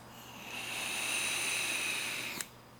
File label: Vape inhale